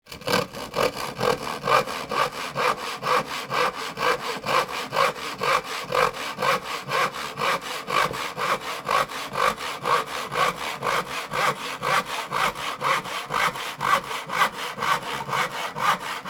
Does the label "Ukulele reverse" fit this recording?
No